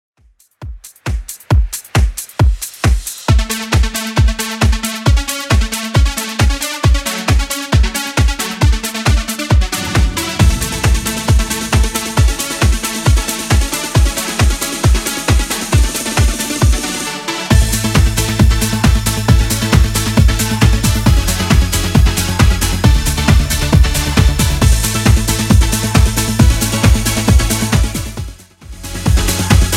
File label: 90s Redrum 135bpm